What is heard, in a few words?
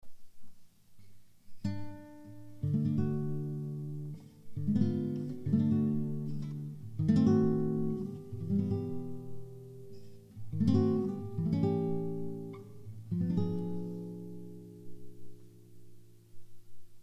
BELLS